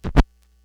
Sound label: Needle Pop, Vinyl Record Samples; Needle Noises